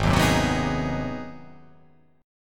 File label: G sharp 7th Sharp 9th